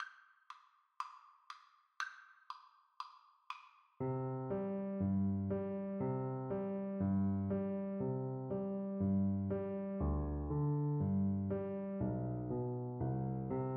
Piano Duet

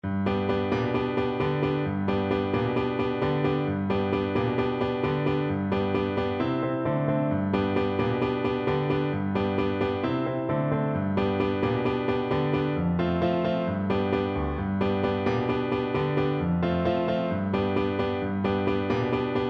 four white horses viola

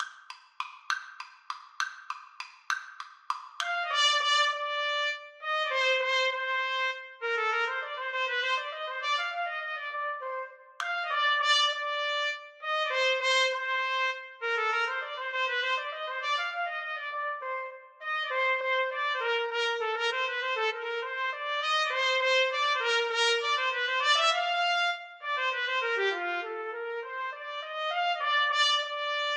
duos menuett, trumpet duet

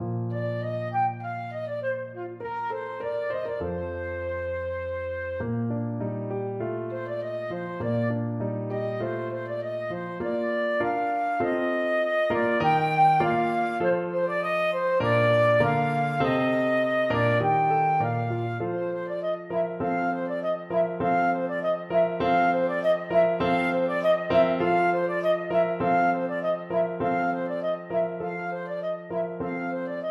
pastoral, flute

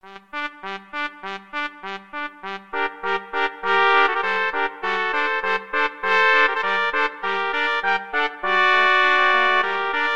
trumpet trio

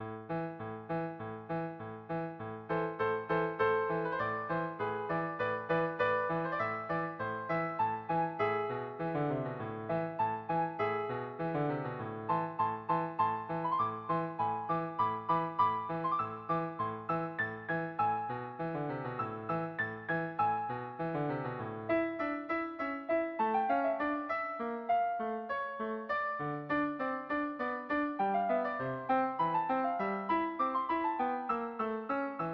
swans easy, piano

